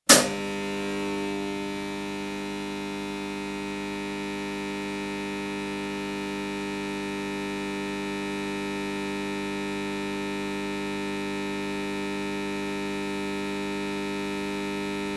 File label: Arc welding machine, switch on, transformer hum in standby mode, off; Welder Sound Effects; BBC